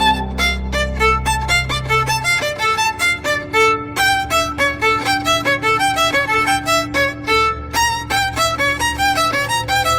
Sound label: going crazy angry violin theme song; homelander